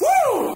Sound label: "woo"